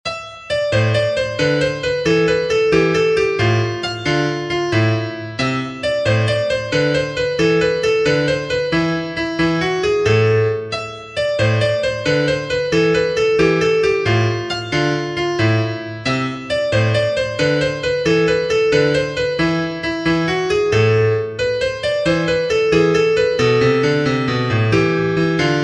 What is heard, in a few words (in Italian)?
Tarantella, pianoforte